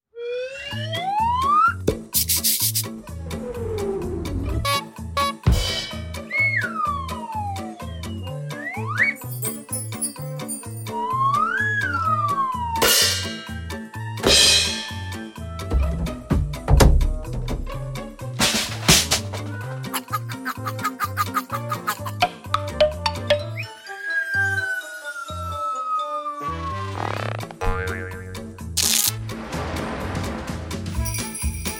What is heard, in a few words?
Slapstick Comedy